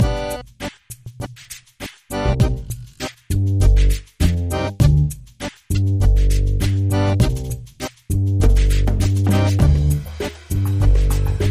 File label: Tango